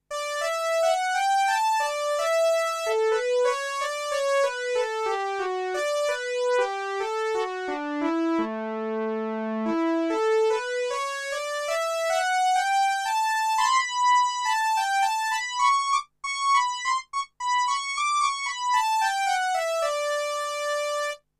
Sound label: Analookalike, A fourth traditional sound based exclusively on the ROMs saw, pulse and PWM waves; Korg 05R/W